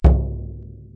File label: arabic perc, Arabic Percussion Kit